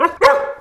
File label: Frost Avalanche Pup bark